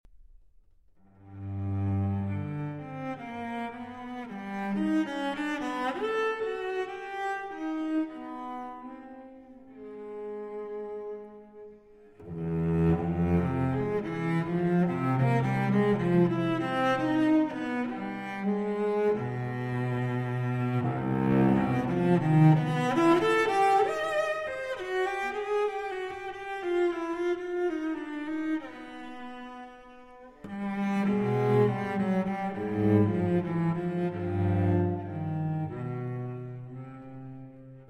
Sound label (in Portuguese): Violoncelo e Piano Prelúdio – Seresta; Suíte Brasileira